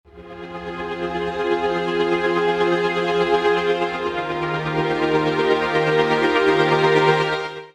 Strings F min; Tagavaka x Basic Wavez

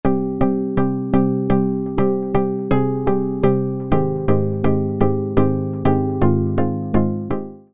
Keys c min; Tagavaka x Basic Wavez

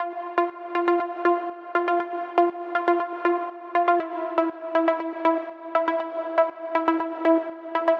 Serum Blip

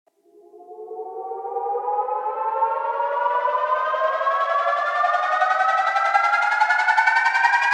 Riser 8 bar 122 Am

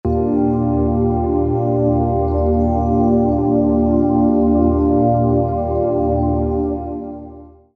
Drone 122 G

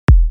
Kick 41Hz E; Currents

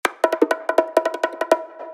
bongo fill high 122 wet Am

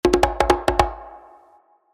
conga fill high 122 wet Cm

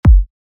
kick afro G

